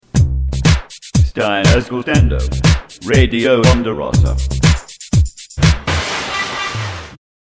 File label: Jingle; Archivio Musica